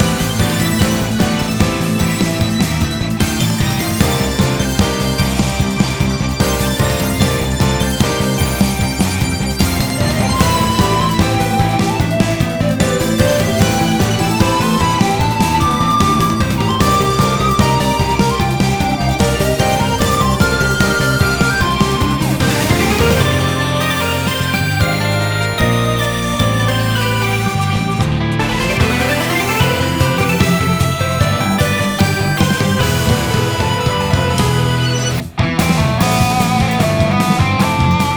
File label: Nest Battle Small end; vivid knight switch windows gamerip 2021